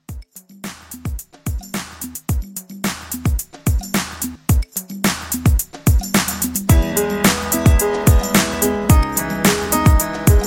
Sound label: 70s Classic Re Drum